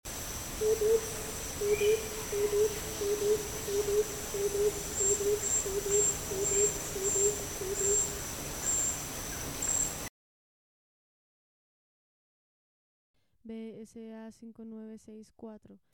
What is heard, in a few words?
gilded barbet